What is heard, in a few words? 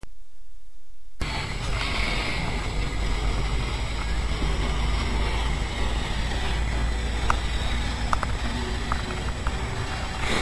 This is a field recording about snow and ice